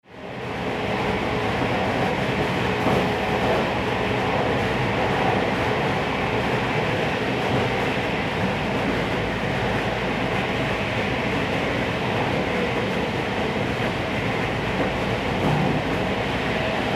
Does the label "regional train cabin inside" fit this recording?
Yes